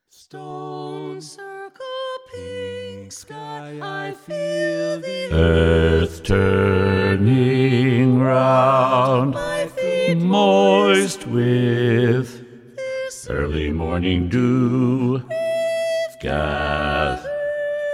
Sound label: Bass focus, choir; Summer Solstice 2